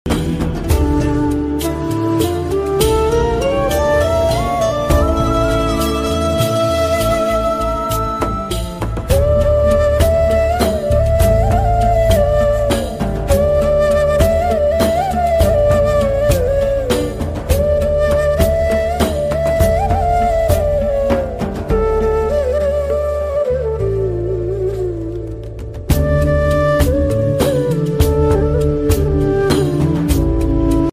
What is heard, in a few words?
ringtone bgm flute tone; prithviraj blessy